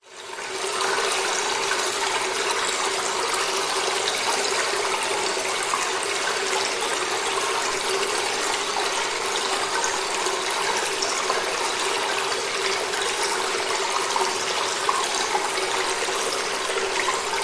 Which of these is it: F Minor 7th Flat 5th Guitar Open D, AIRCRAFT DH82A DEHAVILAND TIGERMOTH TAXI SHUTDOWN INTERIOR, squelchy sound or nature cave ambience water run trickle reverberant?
nature cave ambience water run trickle reverberant